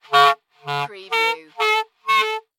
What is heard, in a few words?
Cartoon Clarinet Hits